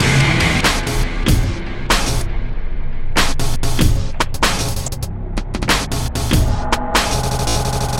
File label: mapend loop, music